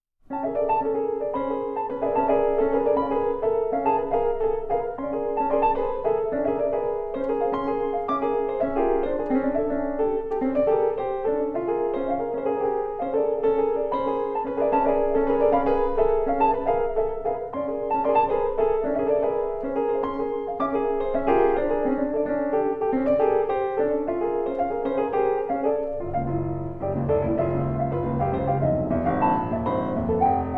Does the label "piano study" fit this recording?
Yes